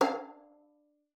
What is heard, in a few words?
FING F5, VIOLIN; Xsample VOL-5